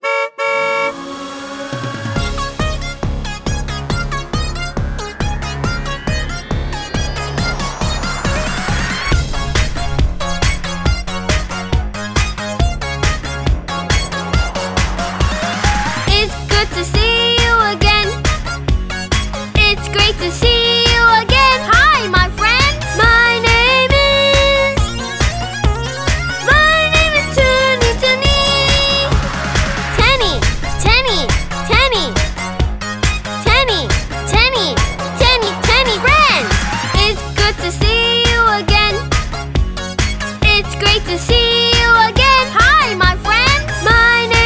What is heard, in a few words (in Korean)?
영어 여 노래 동요; 트니트니